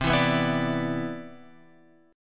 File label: C Major 9th, C, chord; CM9 Chord {8 7 9 9 X 10} strummed